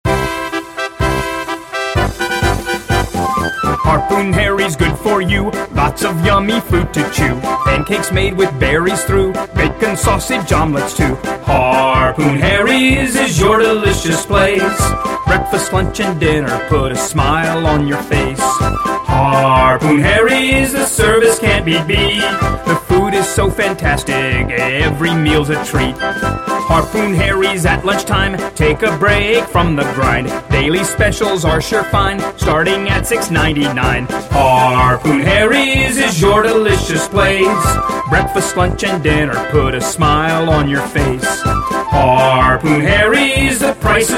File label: March Jingle; Harpoon Harrys